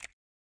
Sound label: button Hover; hotline